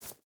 footstep burlap grass jump start; Rust

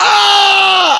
death, english

